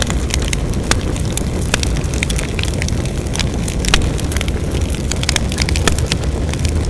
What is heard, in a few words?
ny burning